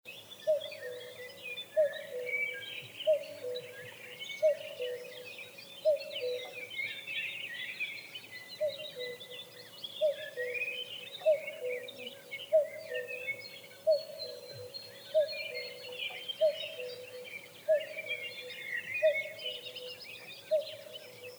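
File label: obyknovennaya kukushka golos, common cuckoo voice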